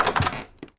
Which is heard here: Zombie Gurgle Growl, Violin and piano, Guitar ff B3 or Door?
Door